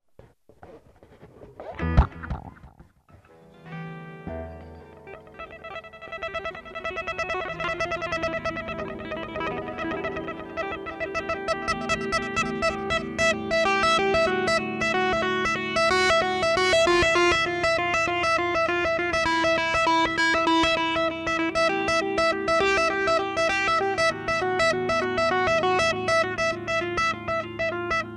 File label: Coming Down, guitar